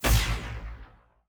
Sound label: Sci Fi Blaster